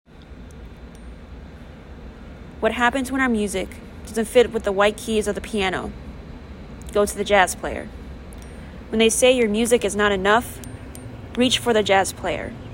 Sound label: jazz layer; maguire art museum